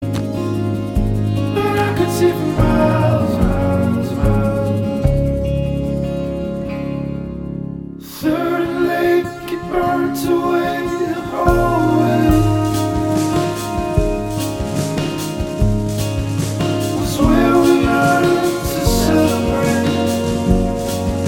light music cool background music tone ringtone

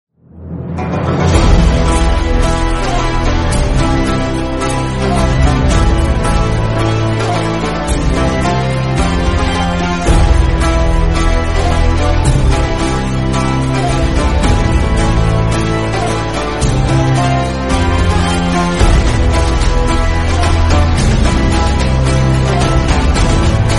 most famous background theme music tone ringtone

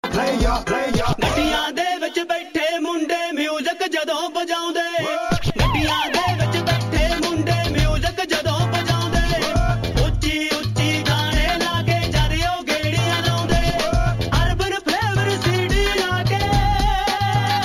punjabi ringtone

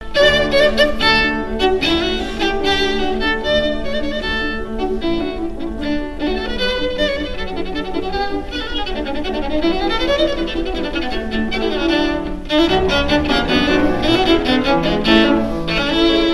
cello ringtone; haydn

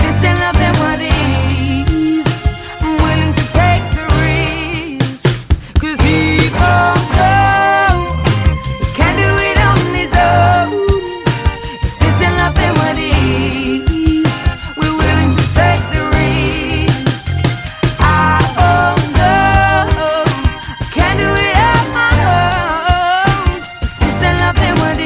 english ringtone

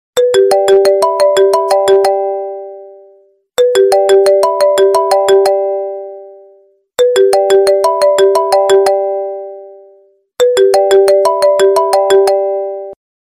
new apple sms ringtone

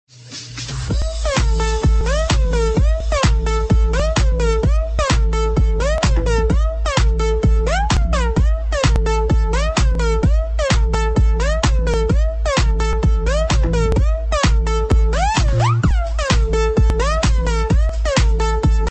ringtone

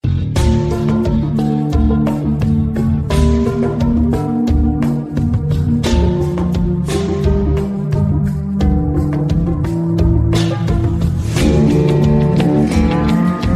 classical remix; breaking bad

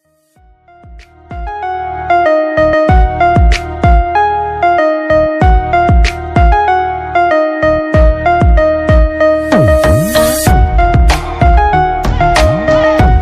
sad bollywood instrumental ringtone

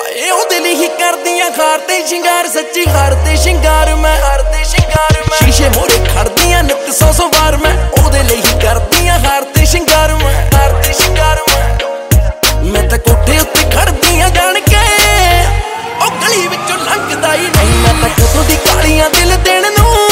punjabi ringtone

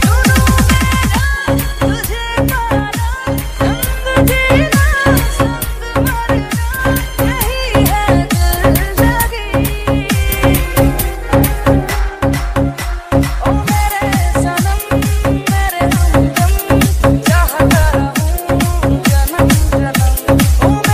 sweet music ringtone

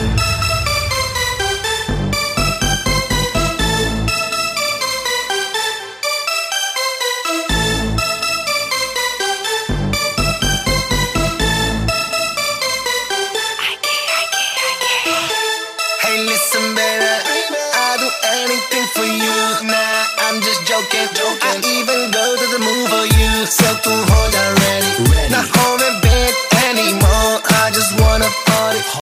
bollywood sweet ringtone

